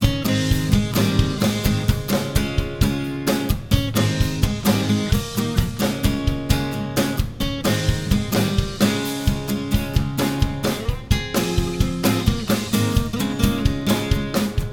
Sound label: Acoustic Ring, ringtones; mobile; Cisco